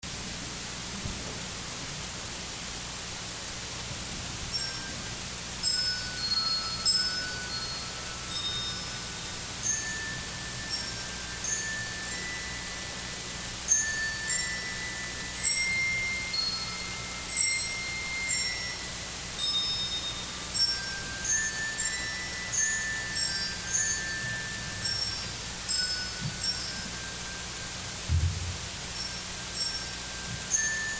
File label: Bell Youth Choir